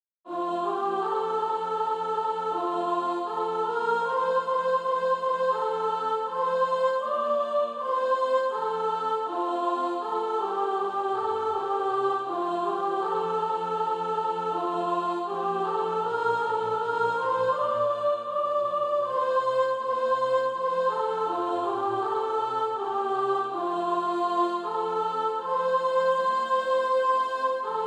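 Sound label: soprano, Practise Music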